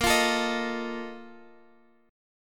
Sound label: Bb Major 7th Suspended 4th; strummed; x,x,8,10,10,11; BbM7sus4